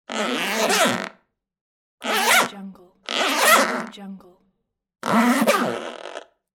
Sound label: zipper